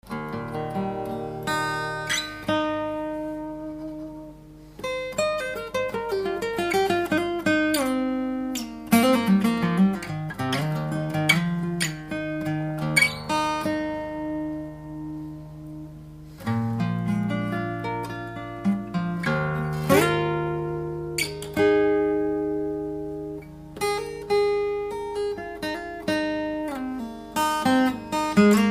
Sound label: jam